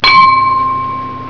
GLAS; 999wavs

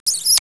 SQUEEKY; PEOPLE

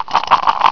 rattle

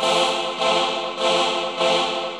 KEYB; MAGIX

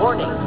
warning

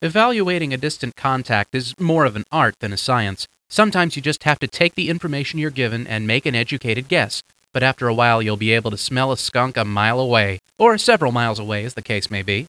HARP

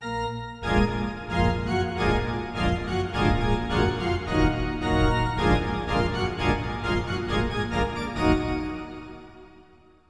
ORGAN

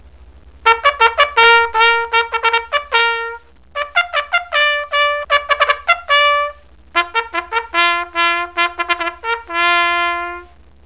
BUGLE

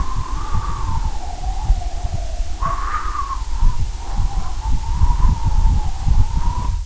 wind; FRANK

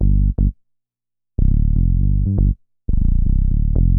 BASS, DANCE; MAGIX

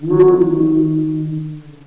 AMB; ABUSE

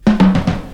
TOM ROLL; BEAT2000

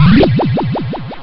land; chute

